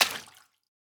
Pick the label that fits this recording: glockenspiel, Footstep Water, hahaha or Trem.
Footstep Water